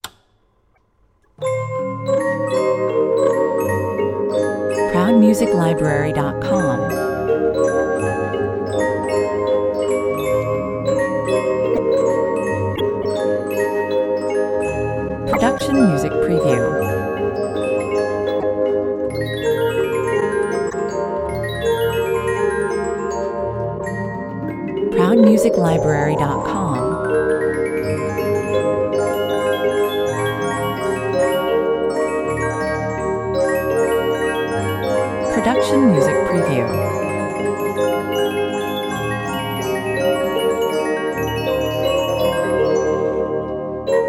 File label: lullaby waltz music box